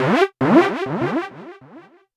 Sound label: Blow Ur Trumpet; Fx; Loopmasters; Jon Carter & Alex Blanco; Block Party Essentials